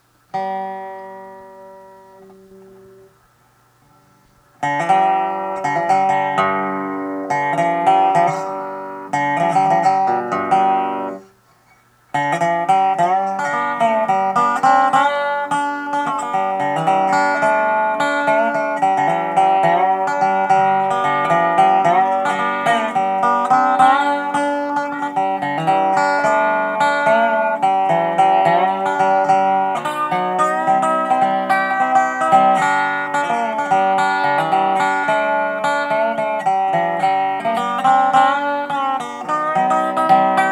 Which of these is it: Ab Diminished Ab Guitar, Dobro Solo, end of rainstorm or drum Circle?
Dobro Solo